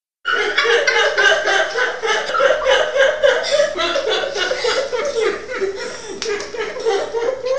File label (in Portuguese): risadas